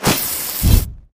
chest Shooting; lost relics

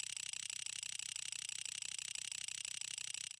reel spin freespin; reel steal

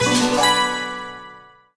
medium Win; steamtower

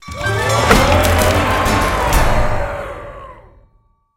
win super mega win; goldentavern

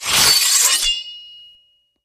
random Wild Sword Start; warlords

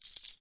collect Win; jacks or better multiple hand

